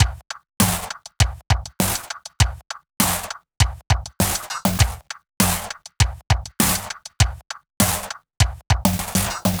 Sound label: Kick Bomb 100 BPM